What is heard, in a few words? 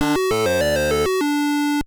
Perfect Bonus